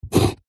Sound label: wood scrape sharp knife score single; foley; zapsplat